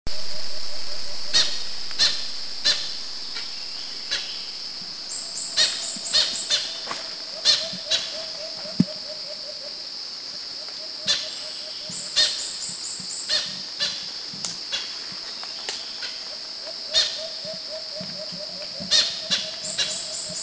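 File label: Samar Hornbill Bohol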